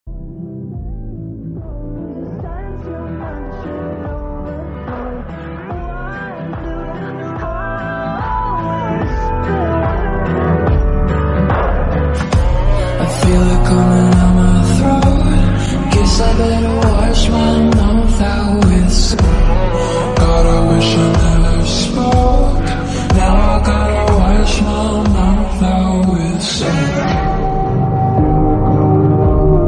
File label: english song